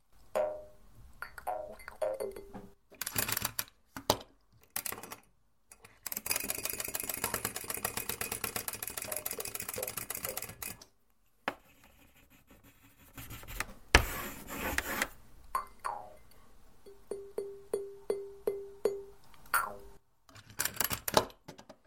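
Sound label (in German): die wohltuenden Geräusche des Staubsaugers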